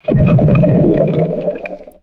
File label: deep underwater bubble burst and frothy gurgle